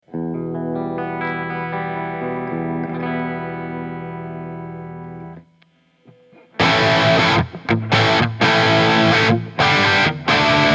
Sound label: cranked plexi